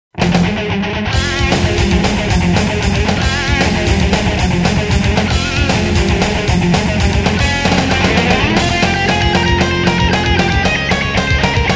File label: two bell silence